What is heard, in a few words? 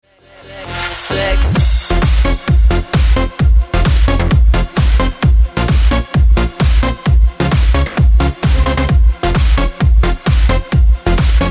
Club Ringtones Electr